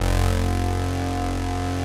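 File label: synth horn F1, Prophet 5; Greytsounds VOL-1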